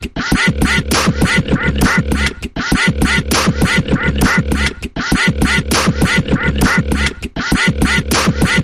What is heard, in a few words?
Mechanical Percussion Variation Short Version